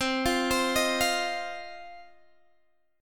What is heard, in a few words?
C Minor Double Flat 5th, Guitar, Open G; down; chord shape